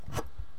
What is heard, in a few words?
chocolate box pickup